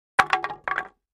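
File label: 2x4 Several Planks Dropped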